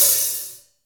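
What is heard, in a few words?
hi hat; Best Service Gigapack 2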